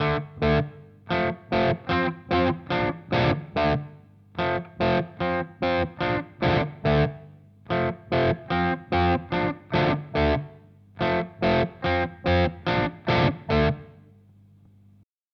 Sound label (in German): Analog Delay Kompressor; Meet Maude